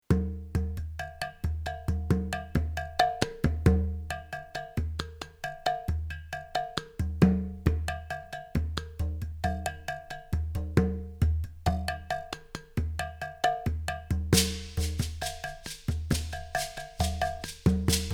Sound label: Ambient Bongos